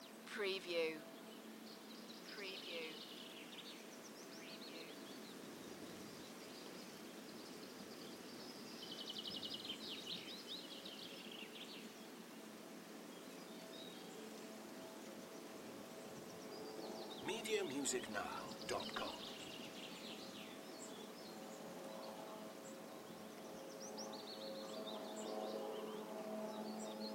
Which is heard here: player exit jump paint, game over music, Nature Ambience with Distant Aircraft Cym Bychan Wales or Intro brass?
Nature Ambience with Distant Aircraft Cym Bychan Wales